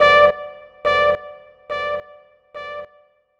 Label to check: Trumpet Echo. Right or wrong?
Right